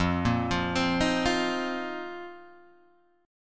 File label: F 7th Suspended 4th F down Guitar Open G